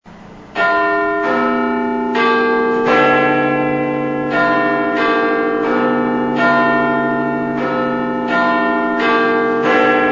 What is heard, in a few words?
Westminster chimes; BBC World Service